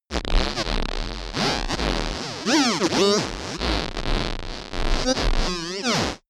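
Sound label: juicy rattle sequence crazy, science fiction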